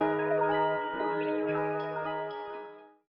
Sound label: ACCENT 155 BPM Abm; Fraktal; raevn creative soundscapes